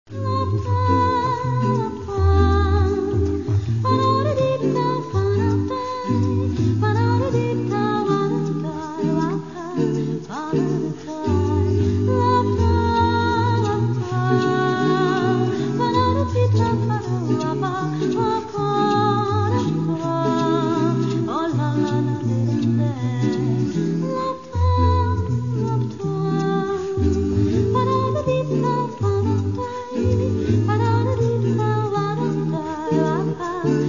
jazz choral

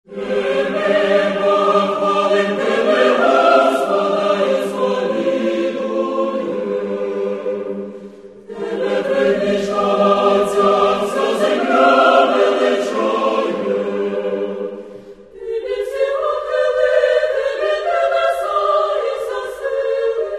choir; NRCU

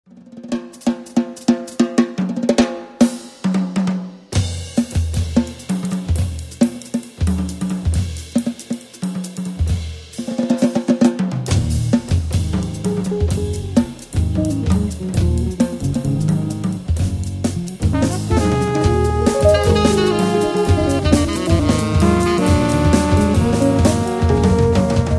drum

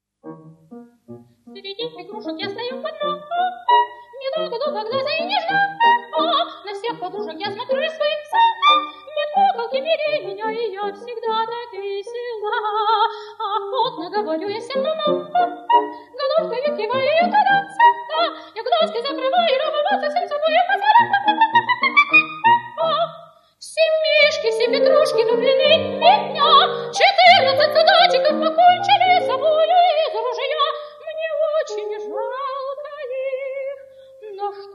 vocal miniatures

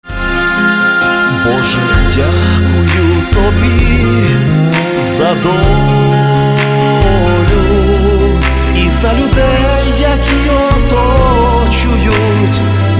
Karpatskyi Chardash

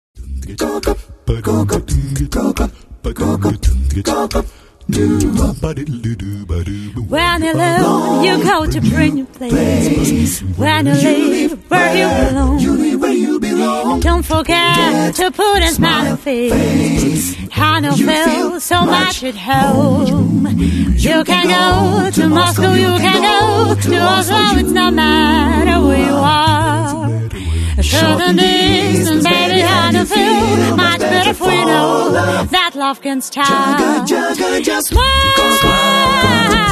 acapellissimo

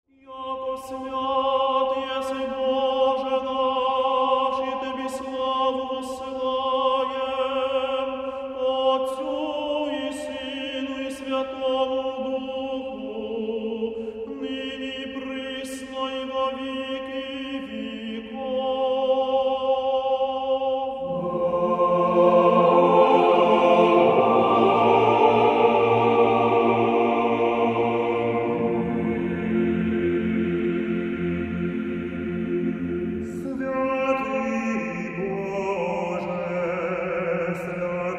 Choir Liturhia; Kyiv

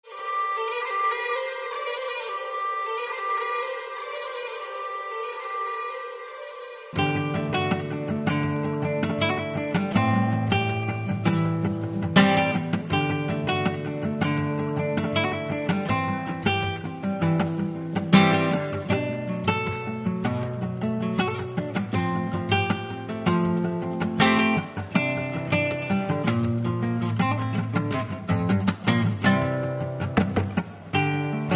acoustic

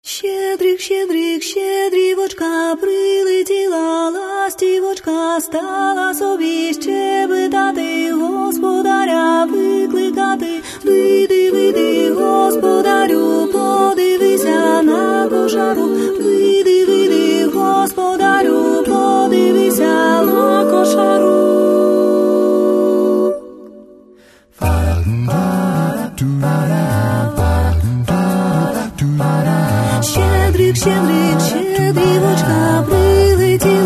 jazz

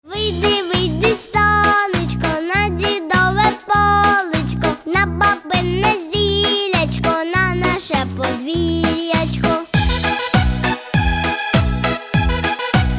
Karaoke

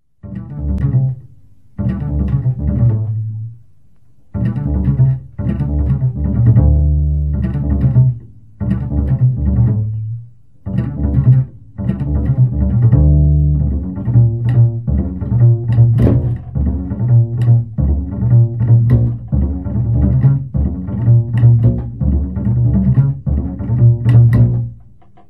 bass

